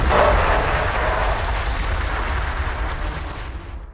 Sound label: Finalboss Damage field active; Folk Hero